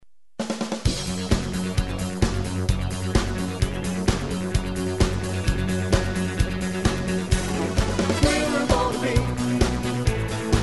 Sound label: impact